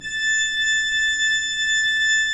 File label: pipe organ, 3-D Audio